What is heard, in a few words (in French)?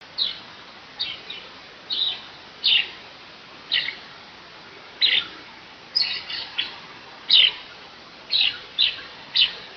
trille